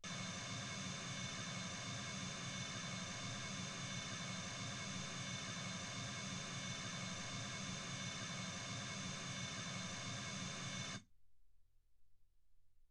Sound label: mls16 48kHz